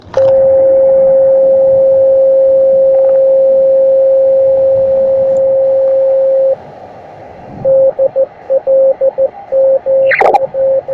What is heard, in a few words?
beacon 10m